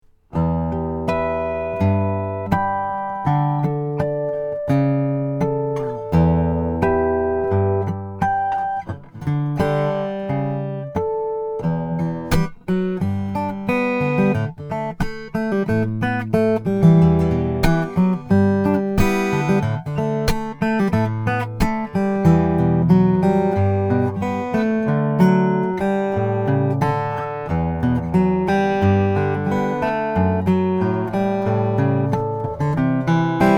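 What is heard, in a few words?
rainsong om1000ns graphite, guitars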